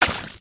water, human step

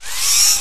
binoculars zoom in; weapons

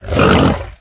boar attack; monsters

